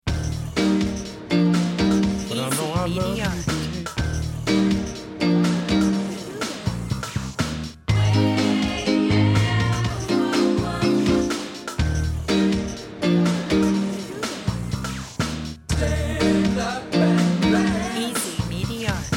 jingle lounge; sounds alike jingle plus 16

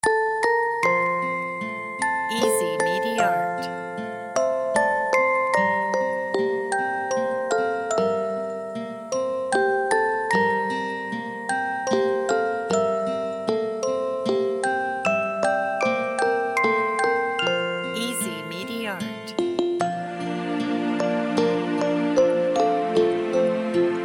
lullaby; dors bien bebe

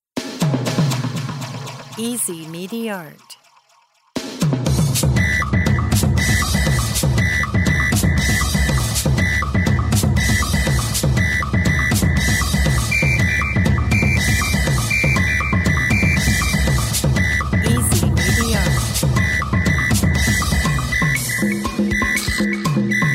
jungle

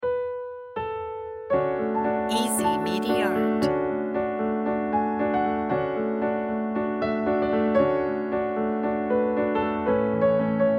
dramatic piano

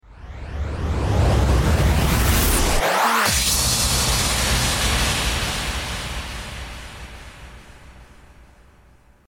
hybrid rise; blogbaster

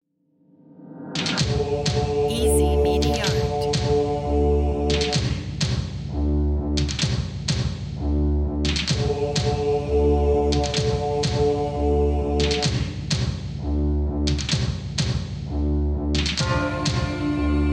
epic choral trailer

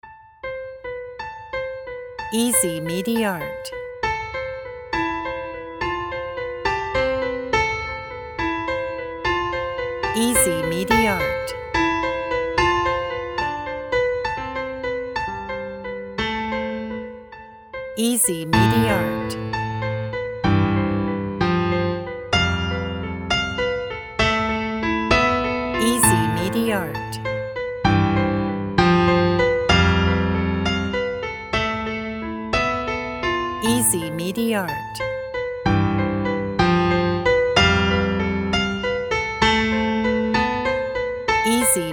piano sadness

